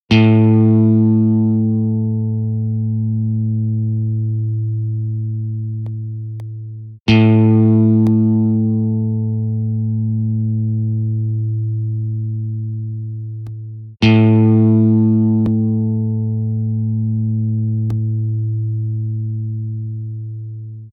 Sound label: A String